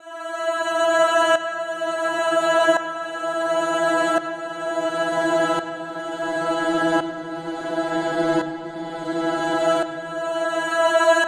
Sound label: 85 Em INSTRUMENT LOOP FALLING FORMANT